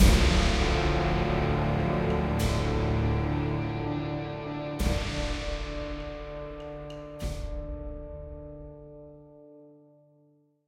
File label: Amb Stinger Death; Assassin's Creed Chronicles China; Weibin